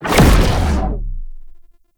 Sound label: Cloak Cast; Warframe; Frumentarius